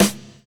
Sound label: DANCE SET, DRUMS